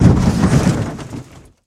Body Fall On Metal Car

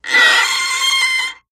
Chalk Screech Blac, squeak

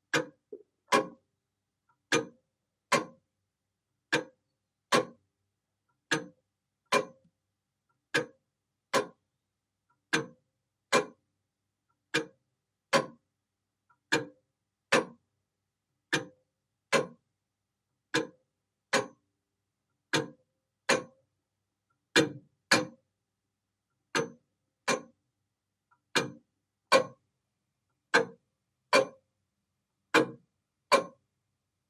Old Mantle Clock Tick

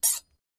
Knife Sharpen Sharp, utensils

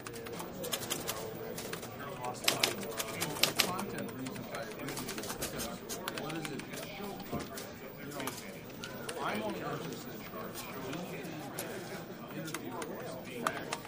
Newsroom Typewrite, typewriters

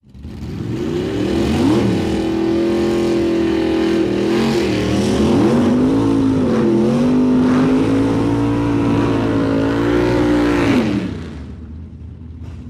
drag race burnout, dragster

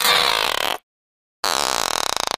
Lizard, Tokay Gecko Spit. Close Perspective.

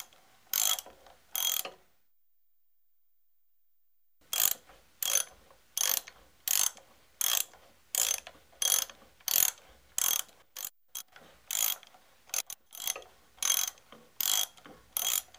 Ratchet Turns, ratchets

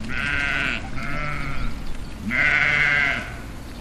Sheep Baahs Med X2

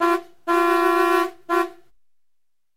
Car Horn Sad X3

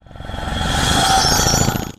Breath Flutter Whoosh

